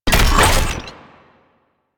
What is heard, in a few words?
Mech Crounch Stop; Nechro